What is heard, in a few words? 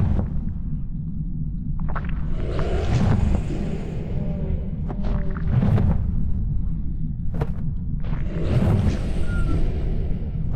voidspawn reanimated moving; Stellaris